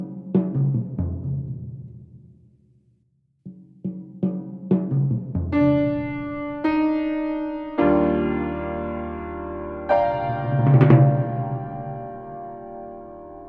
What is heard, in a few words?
Drum and Piano Ringtone